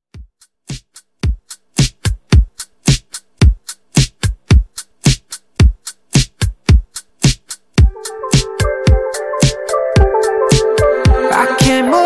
110BPM

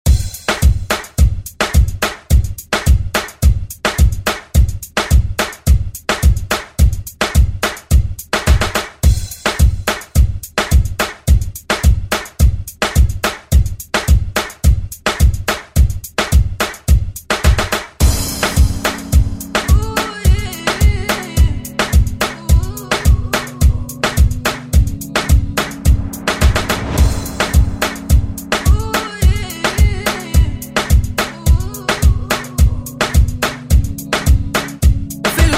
Pop Redrum; Deejay Hit

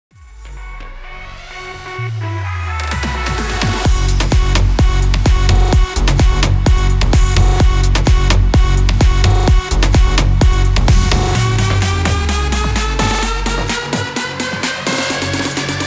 VIP CUT 128 BPM

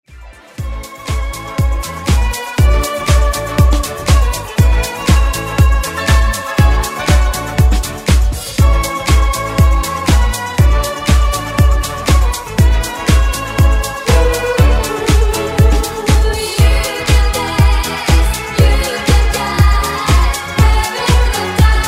70s Dance Redrum

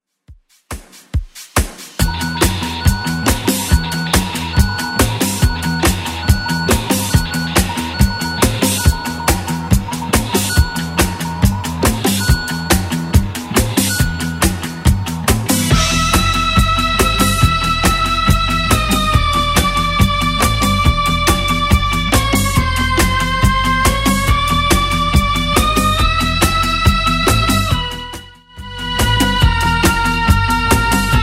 70s Redrum 140bpm

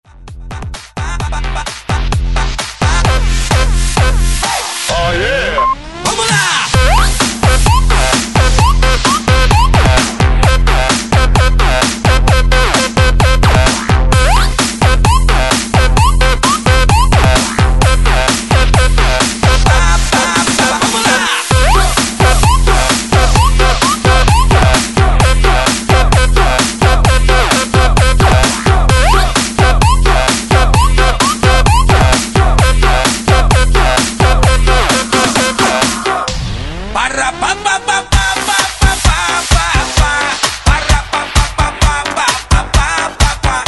130 bpm break mix; DJDOLLS

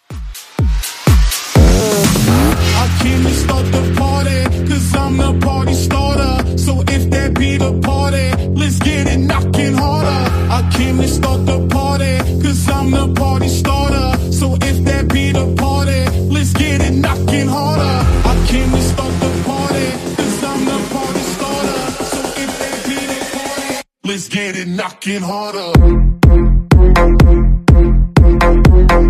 party starter

